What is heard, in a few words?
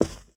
carpet, footsteps